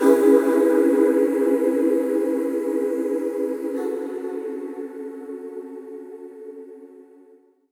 Reverb Athmospheres, FX Vocals; Vengeance Samples

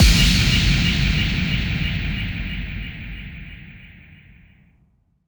FX Boom

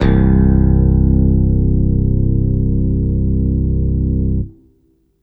Basses C; Vengeance Samples

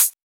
Closed Hihat, Cymbals; Closed Hihats

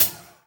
Closed Hihats; Cymbals; Vengeance Samples

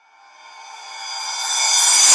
Reverse Crash; Cymbals; Vengeance Samples

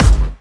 Bassdrums Hard Kicks; Vengeance Samples

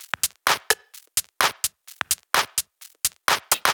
Drumloops 128BPM

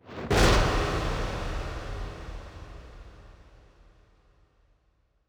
bassdrum reverb FX kick; Vengeance Samples

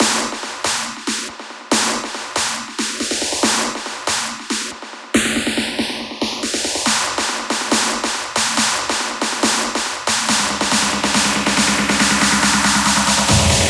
140BPM 16Bars, Hands Up Trance Fills; Sunside; Vengeance Samples

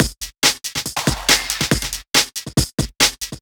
Breakbeat; Vengeance Samples; VEC2 Loops

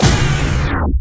Scratchy, FX; Vengeance Samples

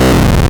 Arcade Riffs

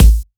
128BPM Kick; Vengeance Samples; VFH2 128BPM Cool Kit

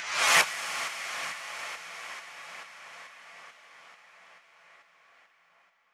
137BPM Reverse Short; Sound FX; D minor; Vengeance Samples; Universe Kit

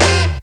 Synths C; Vengeance Samples

